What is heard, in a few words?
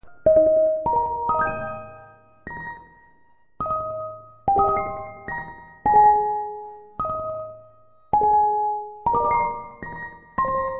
music box